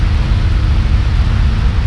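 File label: ambient fuel tube; Star Wars Knights Of The Old Republic II; XBOX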